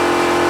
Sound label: rpm, Engine; Cars; XBOX; Trophy